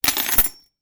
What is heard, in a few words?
household door keys hang on hook; sound effects one